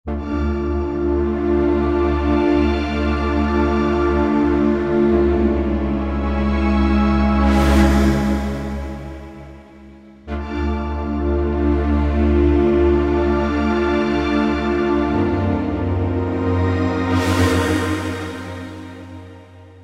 Big Vectors; Roland SR-JV80-09 Session

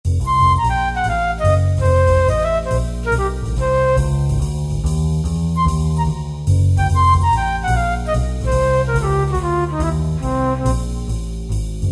Flute Trio

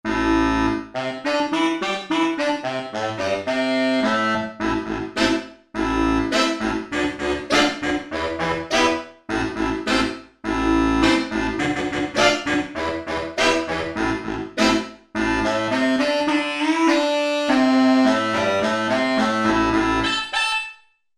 DUB; Korg MSC-1S Variety

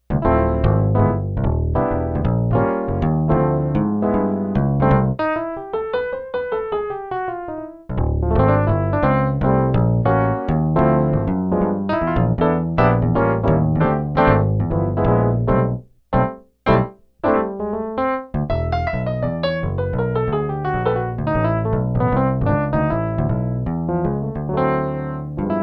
ELECTRIC BASS PIANO

